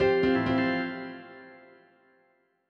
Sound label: useless jingle